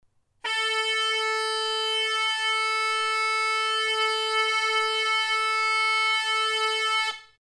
Alboka nota luzea LA

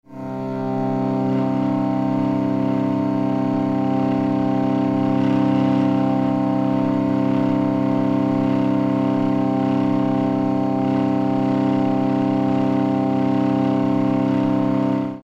Zarrabete bordoia DO SOL

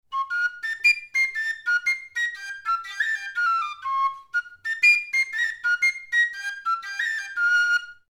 Txistu melodia 1 FA 120 BPM

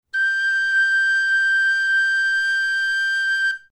Txirula nota SOL

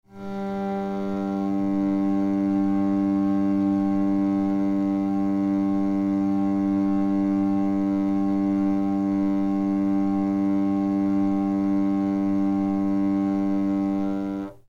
Zarrabete bordoia SOL

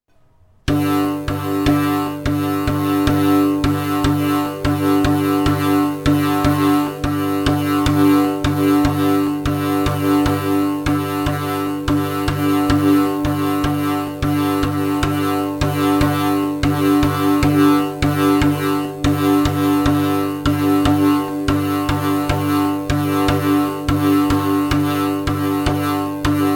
Ttun ttun erritmoa SOL 100bpm

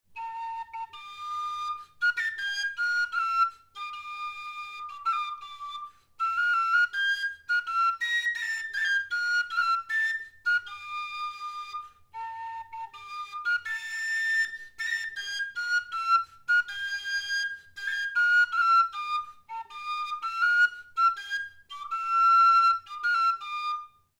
Txistu melodia 2 REm 80 BPM

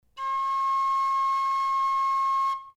Txistu nota SOL

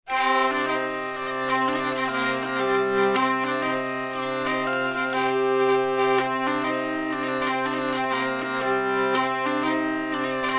Zarrabete melodia DOm 3/4 120 BPM

